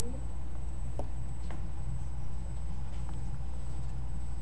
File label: signal